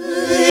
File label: BACKWARD VOX; AMG